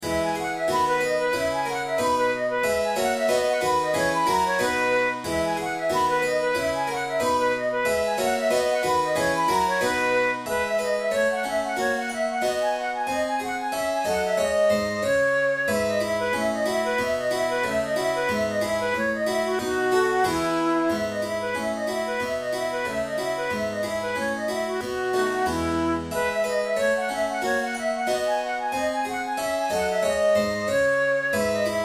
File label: violin sonata gavotta; corelli